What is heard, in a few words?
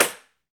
TAMBO, Tambourines